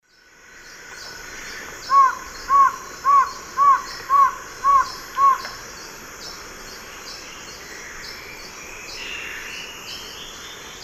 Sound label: Thamnophilus aethiops, song; S. America; Eastern Lowlands